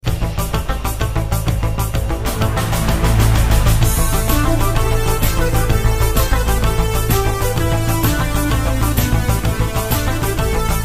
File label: ringtone; airwolf